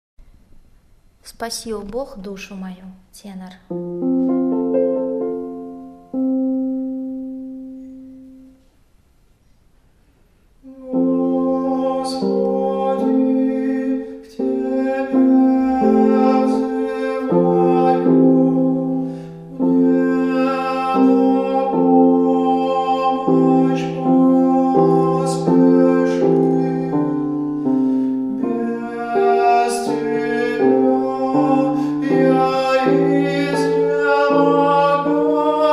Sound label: tenor; spasi o Bog